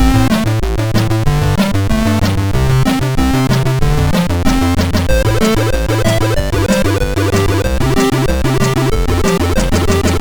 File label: sid MOS6581R2; Mito